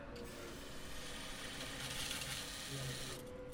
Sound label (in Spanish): cajero automatico contando billetes